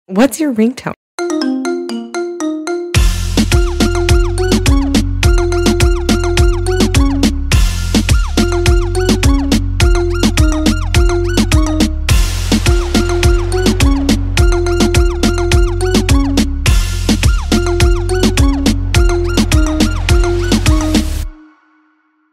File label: iphone 14 pro max